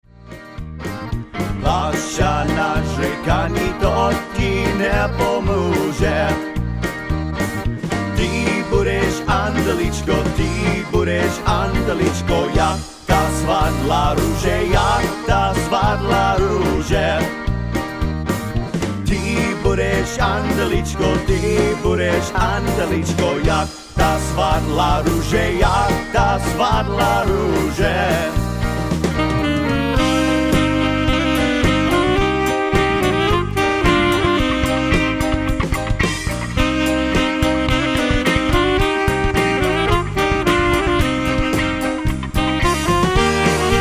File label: Polka